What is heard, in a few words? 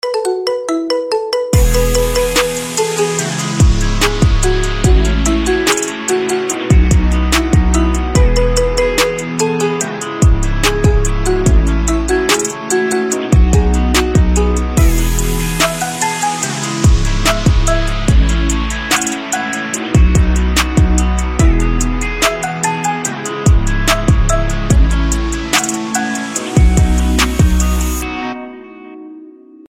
Marimba Remix